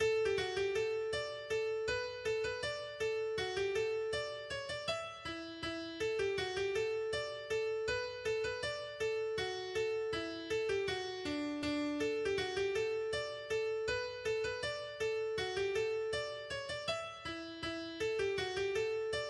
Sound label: Hornpipe; New Hale